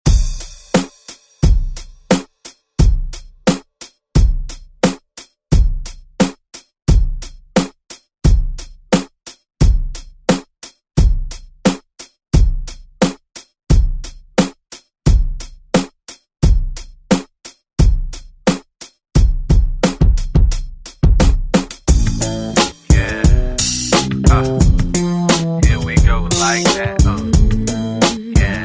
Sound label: 90s Re Drum; DJ Mhark